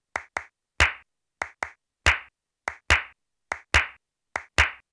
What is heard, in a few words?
alegria, flamenco guitar